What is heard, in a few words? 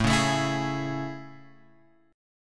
A Major 7th A, Guitar, Open G